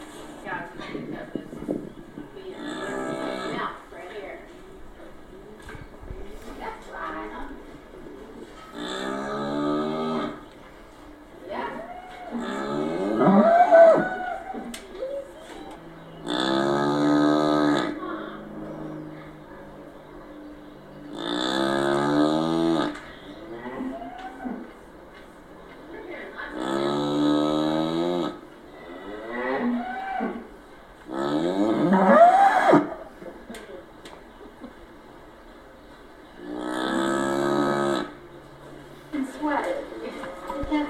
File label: cows in stable